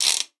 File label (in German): RATSCHE, percussion; Best Service ProSamples vol.42